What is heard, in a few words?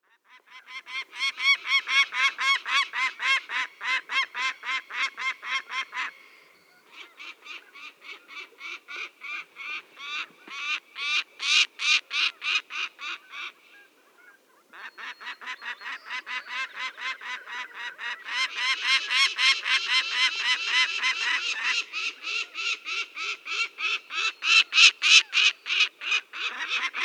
Peregrine Falcon